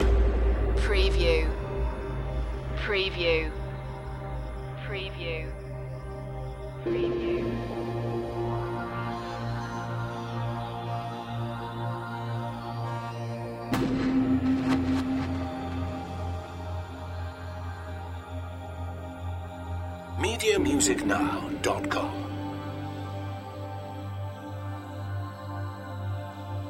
ethnic movements loop

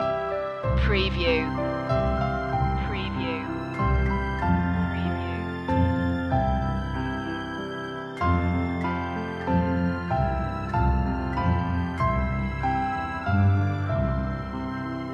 orchestral loop; the first noel; media music now

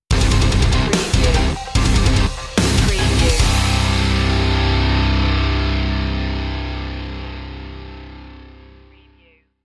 head stomp stinger

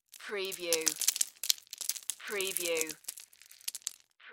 Debris Rock Pebbles

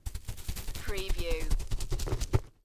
GNOME RUNNING

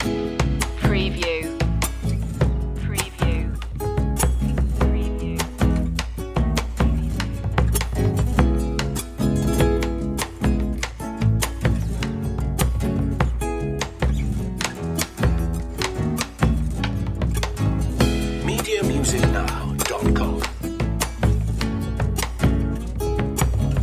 celebrating world music loop

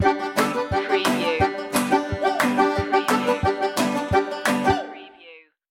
scraping the roux stinger

